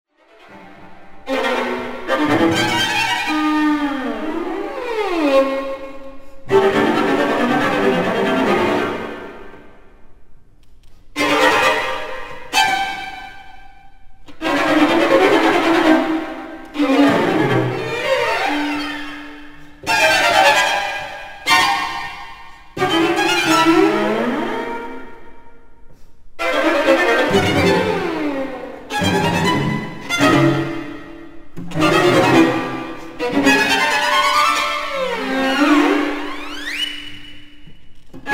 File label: string quartet